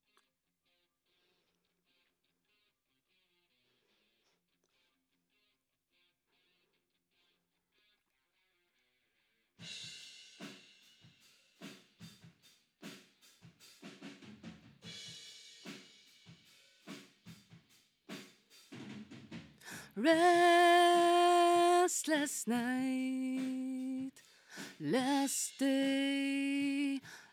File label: Vocal